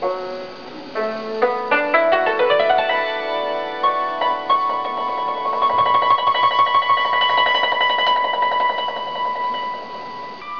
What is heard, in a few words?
yangqin